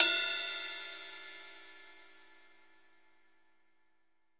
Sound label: RIDE, GM drum set; AKAI S-Series CD-ROM Sound Library VOL-8